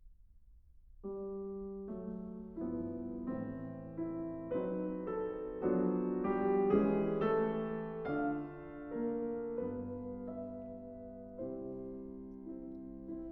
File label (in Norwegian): Hjertets melodier (Melodies of the Heart) for voice & piano; Grieg; 3． Jeg elsker Dig (I Love You)